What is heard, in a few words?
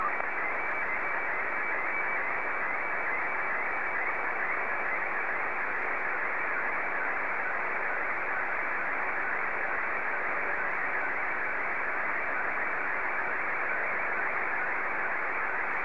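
Martin 1, slow-scan television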